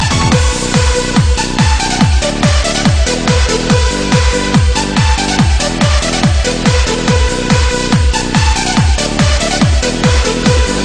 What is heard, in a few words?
Techno Remix